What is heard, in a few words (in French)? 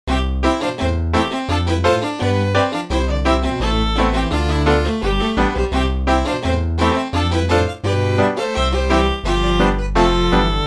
quatuor à cordes; la luna dins laiga